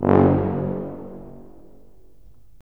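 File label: brass tuba; LA Composer vol. 3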